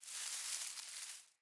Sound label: Forest Open Glade Grass Move Close; Day